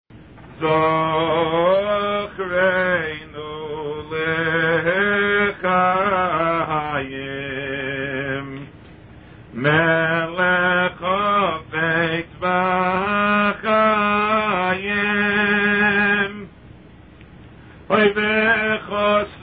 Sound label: Zachreinu, nusach